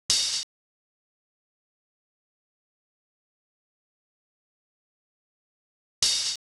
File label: 162, D, open hat; VALVES & VIBES